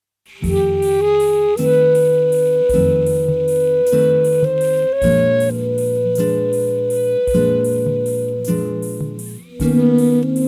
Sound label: sax